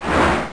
dragon fly; monster; wizardwars

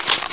hatchet bite; gearbox

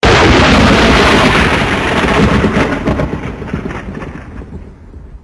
thunder; crampman

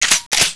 shotgun pump; weapons

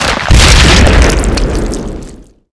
divine wind explode, weapons

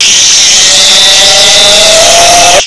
houndeye attack; gearbox

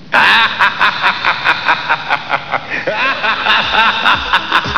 jeje; gearbox

